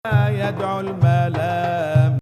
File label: 2nd Ajam